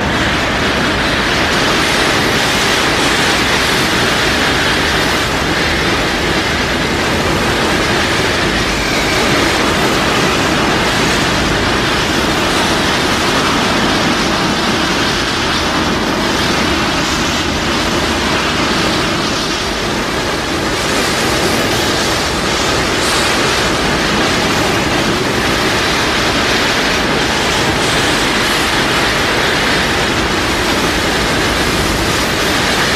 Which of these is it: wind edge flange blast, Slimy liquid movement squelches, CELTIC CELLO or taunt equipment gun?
wind edge flange blast